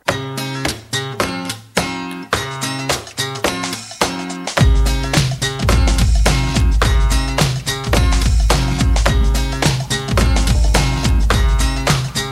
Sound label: Get Going, ringtones; ICE Advant Edge 3G